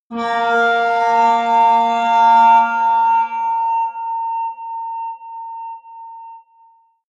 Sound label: atmospheric feedback synthesizer medium, drones